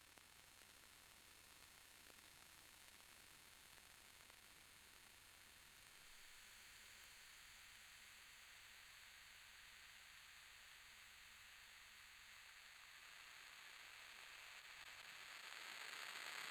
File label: match striking; fire